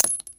556mm shell soil; Escape from Tarkov

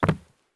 landing wood; Escape from Tarkov

